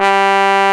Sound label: BRASS G2; AKAI S6000 CD-ROM - Volume 1; BrassWind; WIND&HORN